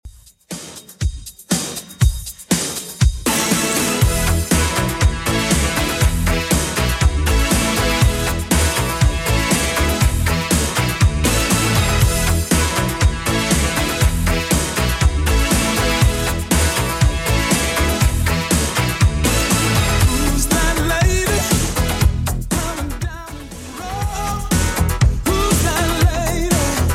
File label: 80s 120 bpm